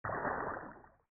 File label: water plotch; The Fish Who Wished